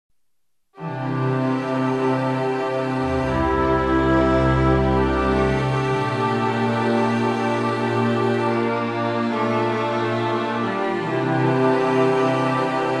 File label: classical